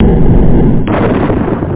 thun

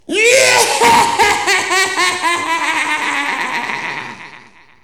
laugh; L'Effet Pommier - Volume 04; HUMAN

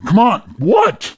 BUMPING; Messiah

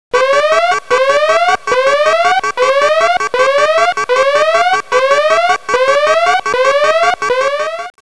alarm; XMEN2

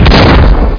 shot, weapons; matrixhl

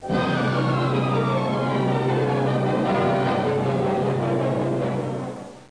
post-buzz

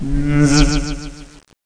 Hyperspace; Asterax

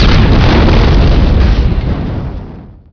medium explosion; SpecOps2 MPlayer Demo

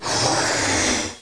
Blow; Bible Fun Pack; Education Publishing Concepts

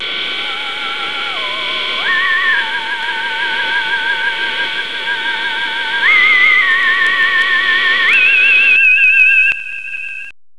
disco; 7invasores

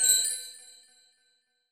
bonus; Ultranium 3D

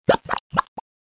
Bubble Thick; Mission To McDonaldLand; mcmars

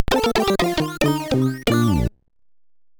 Jingles, SIDTunes

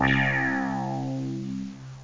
mirage clav; OctaMED SoundStudio V1

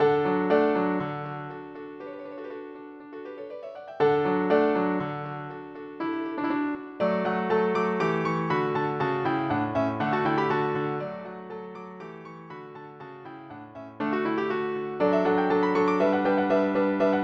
Quartet-MIDI; Encore Demo; Mozart

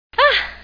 MOAN; Education Publishing Concepts; NOAHDEMO

